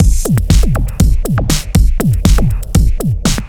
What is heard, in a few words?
Kit 10 Odd Sig 120; off the grid